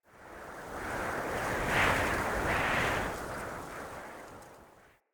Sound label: Mountain Wind Gust Whistle Soft